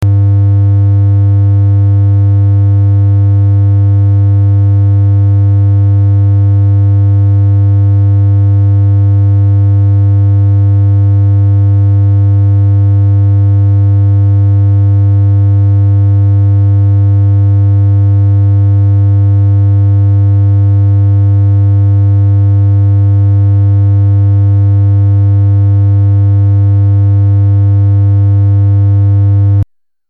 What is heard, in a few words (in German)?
Dreieck Ton 100 Hz